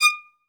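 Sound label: solo violin, strings; ILIO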